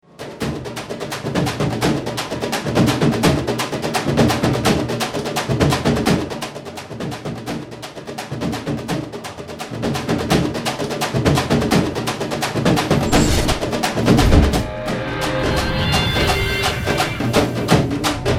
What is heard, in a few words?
rocking guitar indian beat; vinayaks illusionative plan